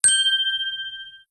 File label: lightbulb